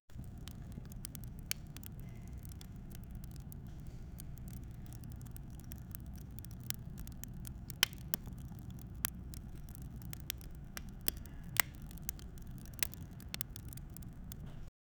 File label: begin with soft rustling; ambience